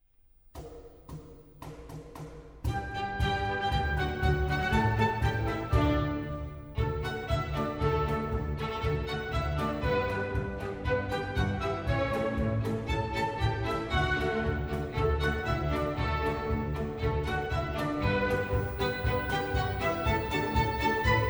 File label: Jingle Bells